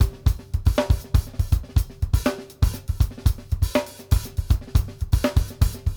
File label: 6-8 GROO, 240; Sampleheads; New York City Drumworks VOL-1